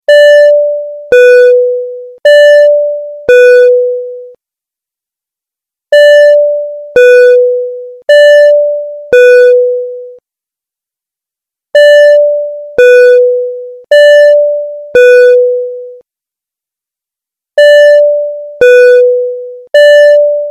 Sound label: flight attend chime